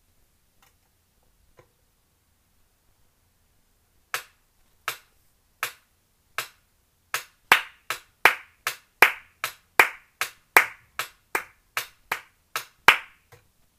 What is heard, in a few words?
rhythm